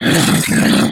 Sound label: snork agroed growl, mutants; STALKER; customnpcs